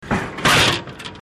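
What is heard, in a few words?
vending machine; jihanki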